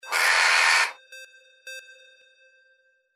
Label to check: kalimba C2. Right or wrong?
Wrong